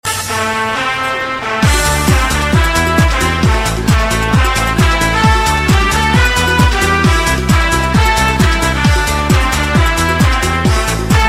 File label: samba